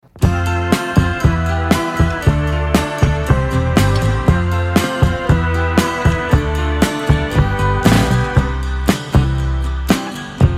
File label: contemporary instrumental